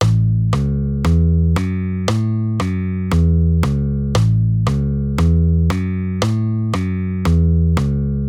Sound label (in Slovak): Figura tony A1 Cis E Fis A Fis E Cis